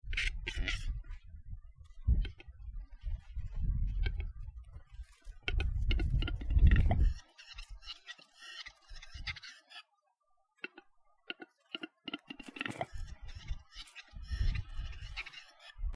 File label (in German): auerhuhn